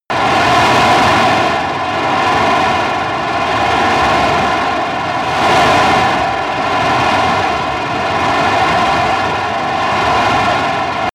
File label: military jet fighter afterburner damaged